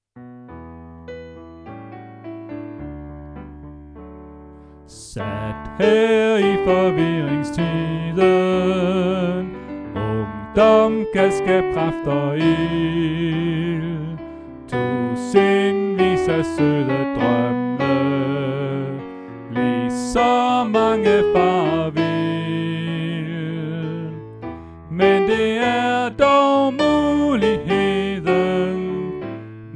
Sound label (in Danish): Sopran; oevefiler